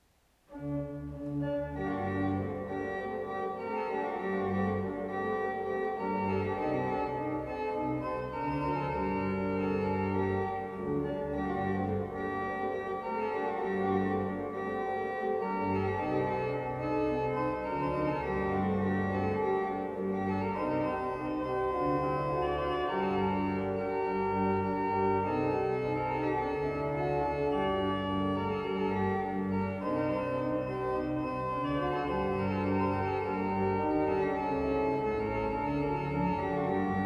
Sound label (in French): improvisation musicale